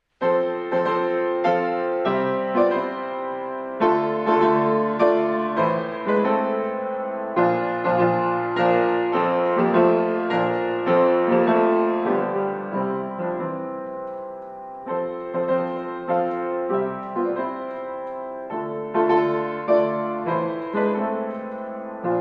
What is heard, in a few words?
mazurka